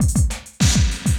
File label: loop, 200 BPM; Ueberschall; Drum & Bass Resonance